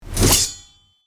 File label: Knight sword stab and gore